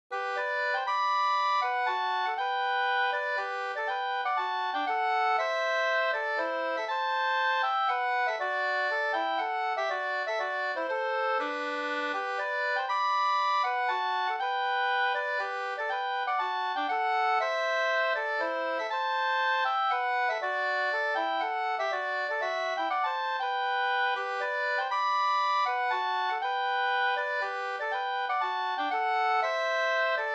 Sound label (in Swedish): polska Bodapolska; A herre Gud na je va brud e Rojas Erik 2st